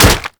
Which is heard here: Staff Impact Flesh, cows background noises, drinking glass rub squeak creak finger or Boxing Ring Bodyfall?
Staff Impact Flesh